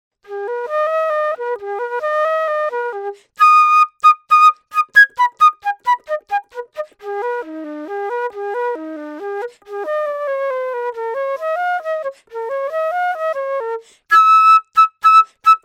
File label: flute low cut